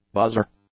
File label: buzzer